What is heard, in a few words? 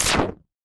Radio Hit; Transitional Elements; 1001 Sound Effects